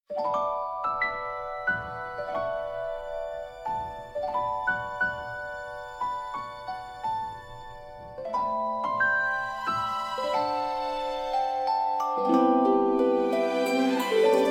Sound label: soap bubble, bgm